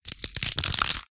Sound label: chain sound effect; Minecraft; Votive Content